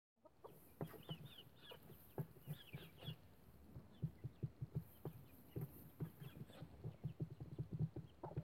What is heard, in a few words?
babies peeping